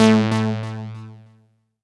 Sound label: saw lead synth